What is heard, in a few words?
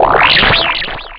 BUBBLEY